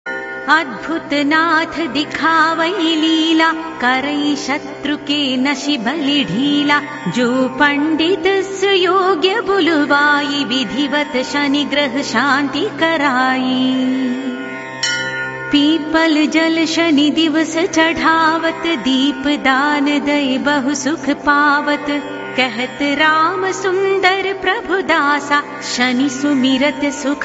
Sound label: shani chalisa; deities